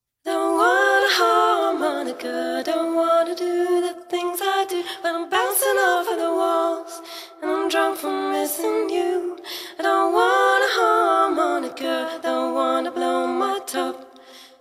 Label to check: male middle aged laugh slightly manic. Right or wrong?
Wrong